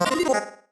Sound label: marimba dice; ゆめ2っき